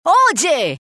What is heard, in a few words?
rock; rocktopus